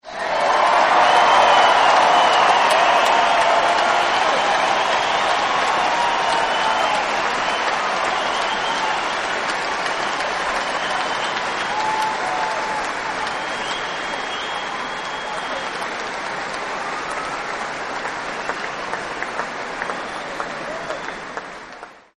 Big Cheer Whistles; Crowd Sound Effects

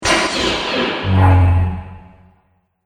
Starship Shields Up; Spacecraft

